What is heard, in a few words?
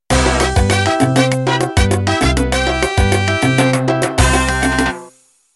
Salsa Intro